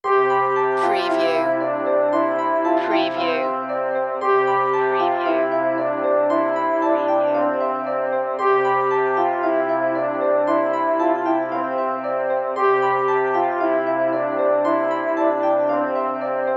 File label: Xmas, on-hold music